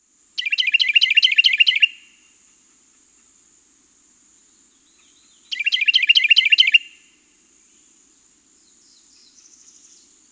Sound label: Carolina Wren